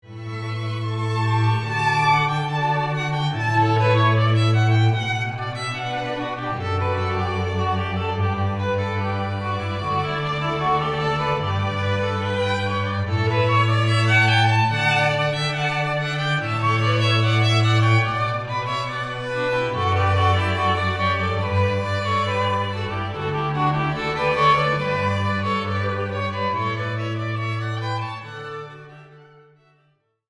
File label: quartet